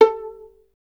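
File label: STR violin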